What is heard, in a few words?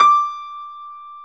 PIA HIGH G, Grand